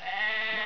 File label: sheep